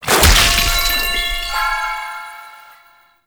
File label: Paint Deposit; Gameplay; Warframe; Shadowgrapher